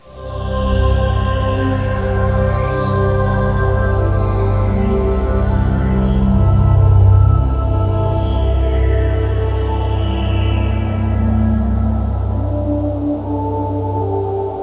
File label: mono 8bit